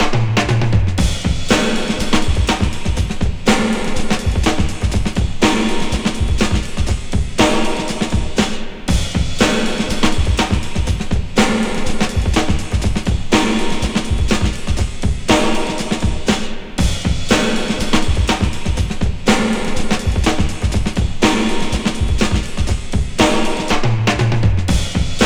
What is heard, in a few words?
121.5 Big Plate Bins, breaks; Lockdown Breaks